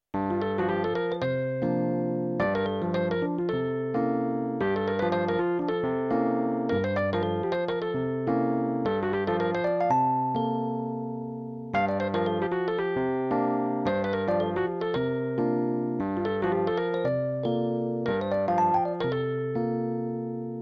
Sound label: jazz piano